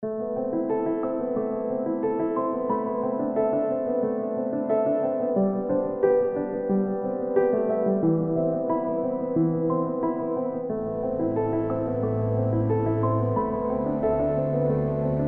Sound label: piano thing; algorithm